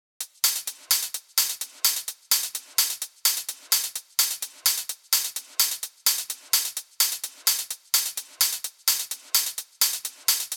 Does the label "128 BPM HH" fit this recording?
Yes